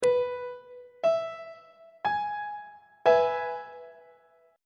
d double sharp major 2nd inversion; treble clef

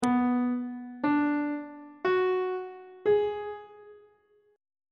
c flat minor 6th chord intervals on treble clef

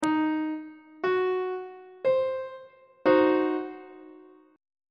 d double flat diminished 1st inversion; treble clef

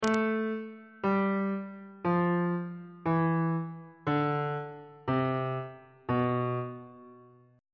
c flat locrian mode descending on bass clef

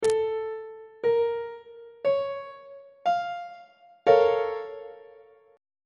a sharp minor major 7th 3rd inversion; on treble clef

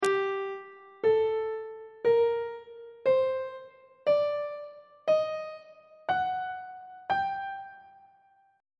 a double flat harmonic minor scale on treble clef

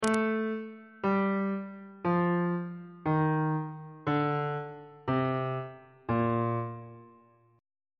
b flat major scale descending on bass clef

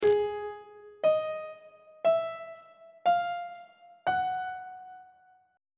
a flat 6th intervals; on treble clef

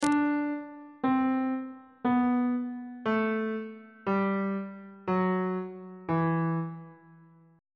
d double sharp minor scale descending

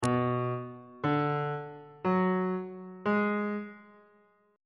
c flat minor 6th chord intervals

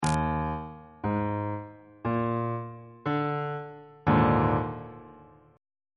e flat major 7th suspended 4th chord

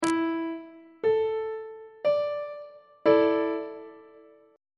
a suspended 4th 2nd inversion; on treble clef

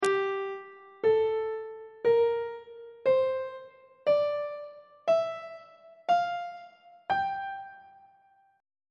g dorian mode on treble clef

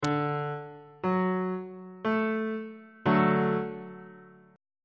d major chord on bass clef

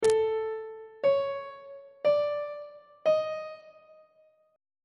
b double flat 4th intervals on treble clef

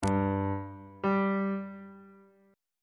f double sharp note on bass clef

